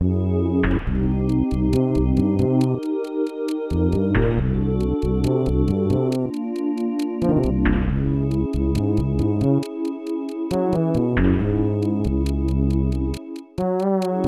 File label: Dungeon; Metal Max 2; snes